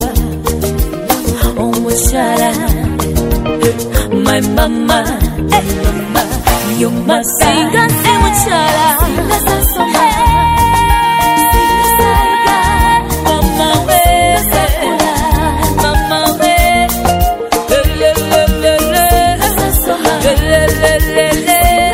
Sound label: nice ugandan ringtone